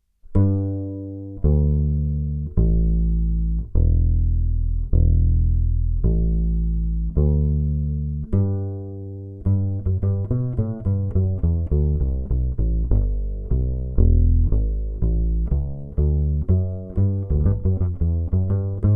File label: piezo pickup